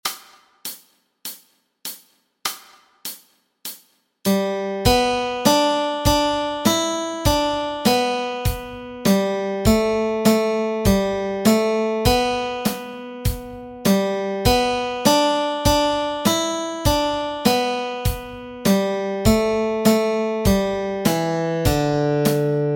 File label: Melody